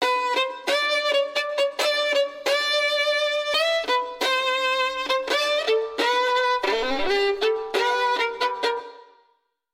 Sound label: violin only; neoviolin musician